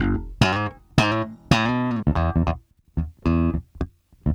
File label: Bass Loop, Ambient Grooves